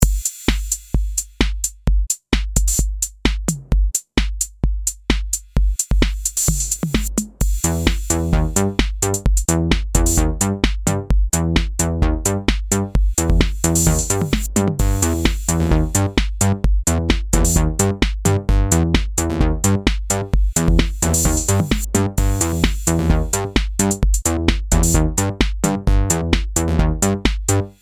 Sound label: The Minor Keys Groove; daily-groove